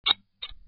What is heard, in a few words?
scissor cut